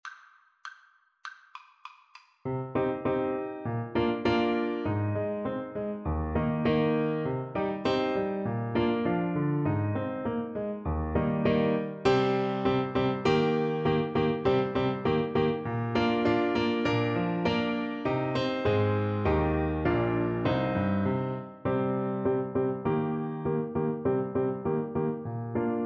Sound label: clarinet